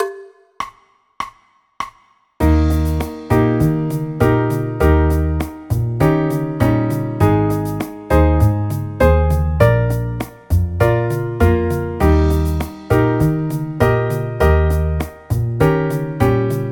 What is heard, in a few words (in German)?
eb dur akkordverbindungen